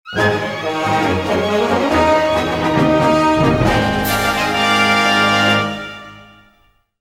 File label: Victory; Link